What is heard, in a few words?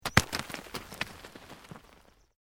ROCK TUMBLING; Material components for constructing more complex sounds; Rocks; Materials